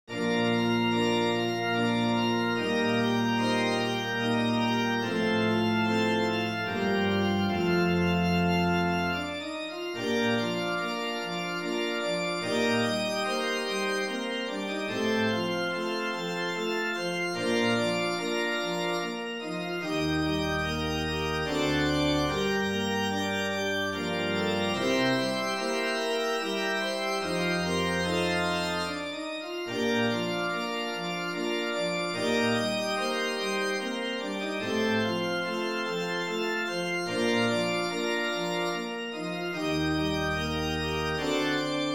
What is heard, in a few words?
organ